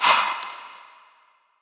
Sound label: percussion